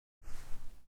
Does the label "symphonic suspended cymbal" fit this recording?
No